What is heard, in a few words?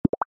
receive, alert